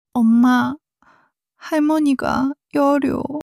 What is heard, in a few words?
crying